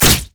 spider basic attack; Elise

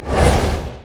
anchor chain; nautilus

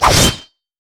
crit attack; masteryi base

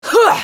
spell cast effort, en US; Sivir

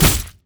basic attack; renekton

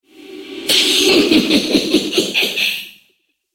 spider laugh; Elise

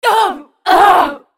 dying; Zyra; en US

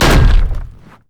basicattack; LoL; trundle

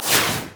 carpet bomb; rumble

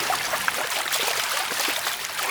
water small

